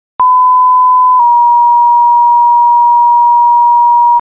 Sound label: page, tones